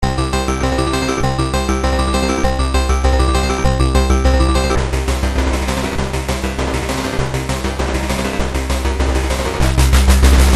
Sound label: wave seq, evolver